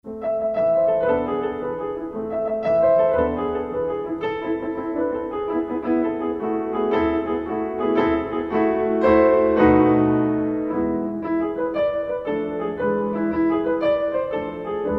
Etude in A minor; Marlais Book 4

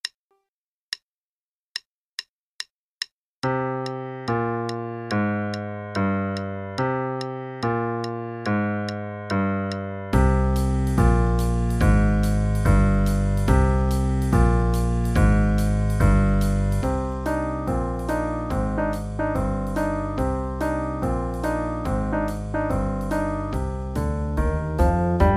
Boogie (w bass and drums, qn=144); Level 2B - 2nd Ed - page 42; Pumpkin Boogie

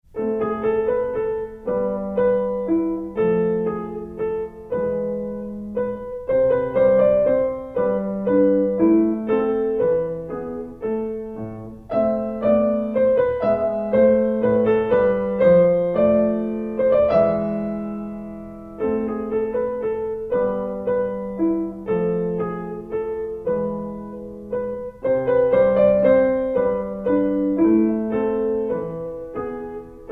Minuet in A minor; Marlais Book 3

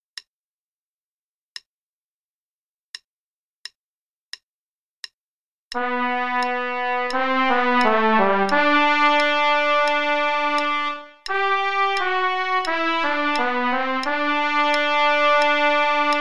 Play-a-long, in G, trpt part, qn=88; Illiad; trumpet

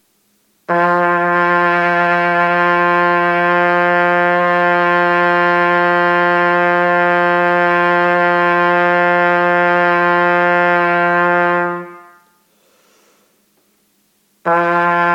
Long Tones Low G, trumpet

